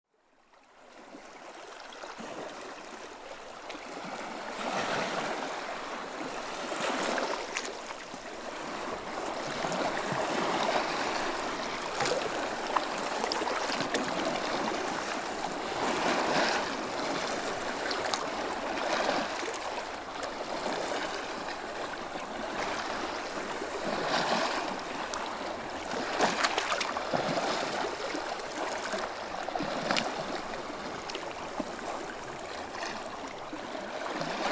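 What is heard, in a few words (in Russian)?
слабые волны на берегу спокойного моря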